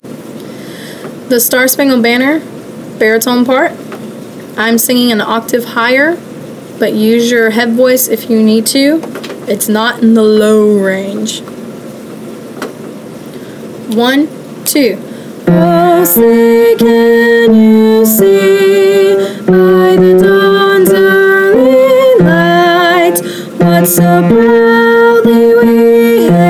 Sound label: the star spangled banner baritone